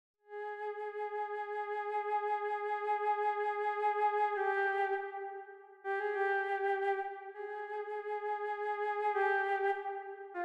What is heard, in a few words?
Flute